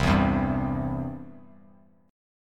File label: C Major, Bass Standard; strummed; JGuitar